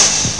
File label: new hihat; OctaMED SoundStudio V1; jazzies samples; perc; hihats